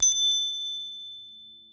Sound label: step tile echo; OneShot Fading Memory